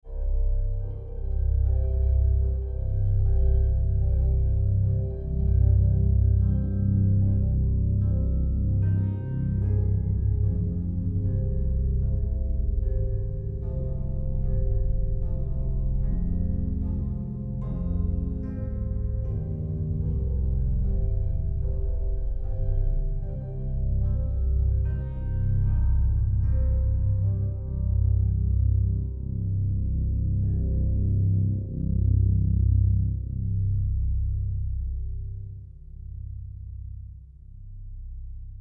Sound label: Eerie